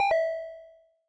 Warning

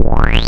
a UFO Start

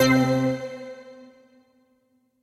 intro Worlds; todee and todpee

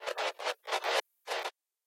intro Write; todee and todpee

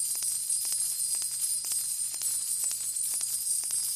megawin coins; aviamaster; Aviamasters

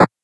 land; todee and todpee

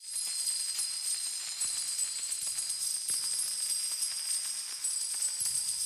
bigwin coins; aviamaster; Aviamasters

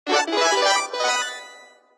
win hi; aviamaster; Aviamasters

